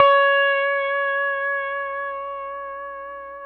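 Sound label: pedal steel C#5 left; PEDSTL NOTES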